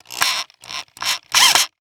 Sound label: Industry Tool Drill Power Drill Tighten Screw In Wood